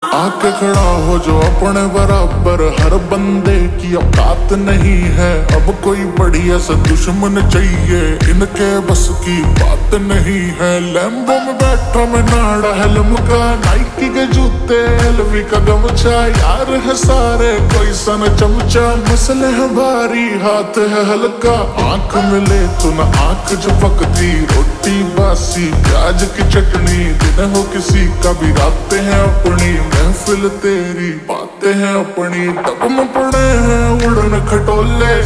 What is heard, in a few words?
Ringtone Slow Reverb